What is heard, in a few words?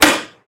M203 Grenade Launcher